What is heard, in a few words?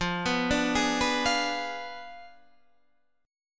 F Diminished 7th F down Guitar Standard; Fdim7 arpeggiated; JGuitar